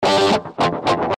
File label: Nasty Gtr D